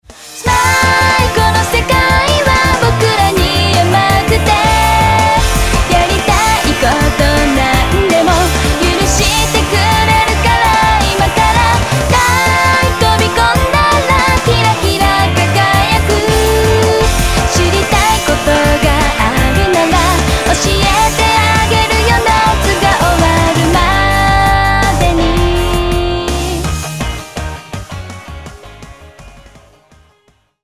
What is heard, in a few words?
summer